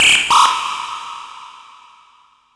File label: SONAR, Hard & Loud Techno